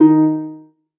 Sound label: lyre